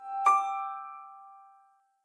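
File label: notification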